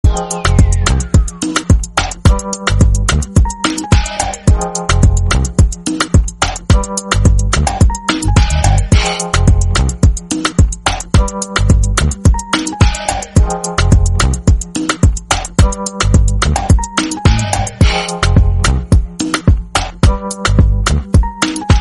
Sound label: woman instrumental